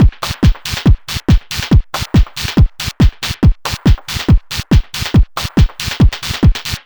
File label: Dance Drumloop; Drums; Dance Beats